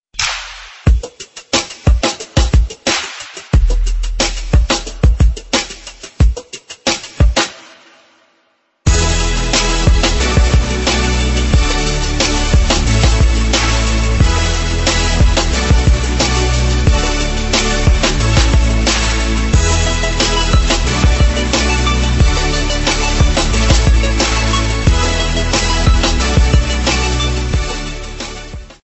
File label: hip hop music; iPhone